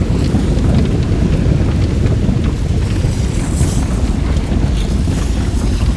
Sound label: lava loop; Quake 4 Alpha; environment lava